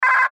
Radio Morse Code; Weak Signal Letter S; Warfare; Blastwave

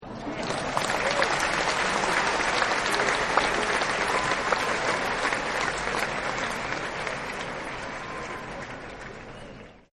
Applause Light; Human

